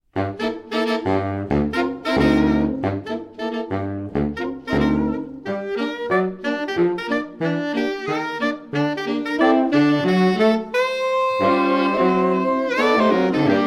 sax

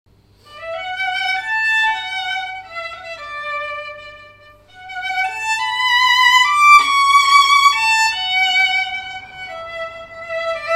ensemble

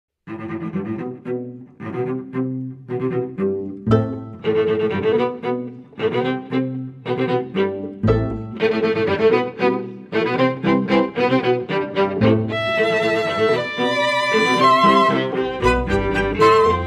sax and strings

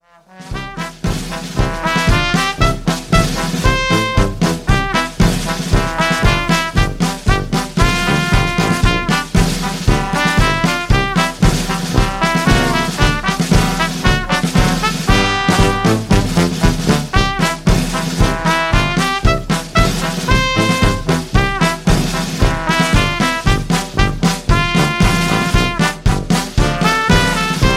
brass

